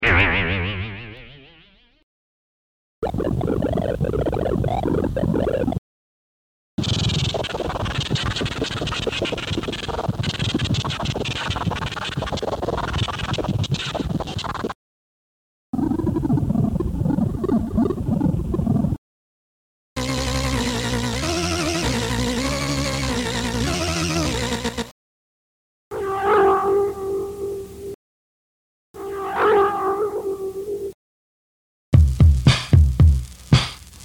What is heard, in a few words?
Beats and Effects